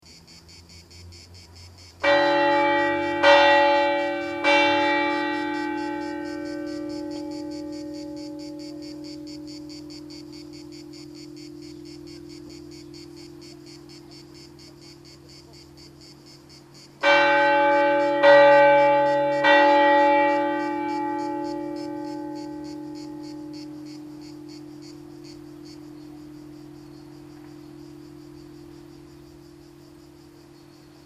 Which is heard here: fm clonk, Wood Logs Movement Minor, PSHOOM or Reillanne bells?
Reillanne bells